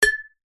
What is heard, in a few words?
impact glass jar hit